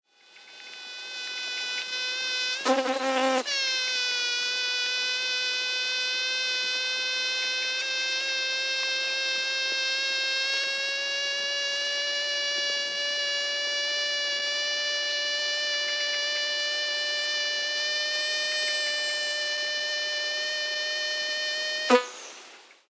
schwebfliege; was summt

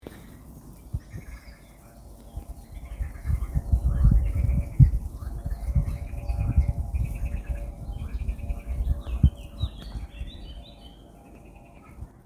seefrosch

